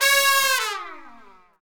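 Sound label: trumpets FX, Brass; Roland L-CDX-03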